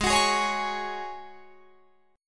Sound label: G sharp 7th Suspended 4th G sharp, Guitar Drop D